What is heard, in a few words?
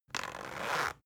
zipper soft